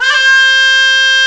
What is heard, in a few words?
zurna, arabic